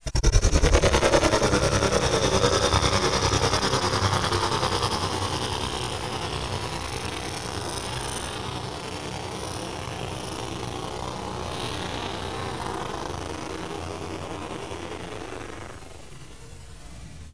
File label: noise, vocal stretches